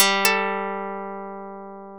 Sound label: karplus